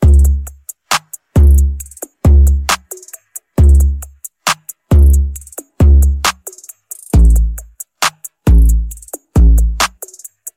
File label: Hip Hop; Remixfreakz; Hip Hop Redrum